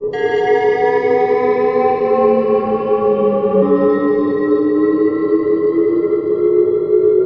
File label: Shifting Tones; Sonic Foundry (Sony Creative Software); Loop Noir